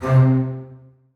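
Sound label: basses staccato c3; Sonatina Symphonic Orchestra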